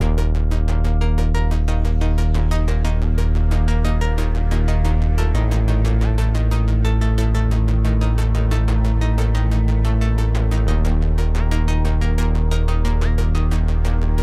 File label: Synth Ebow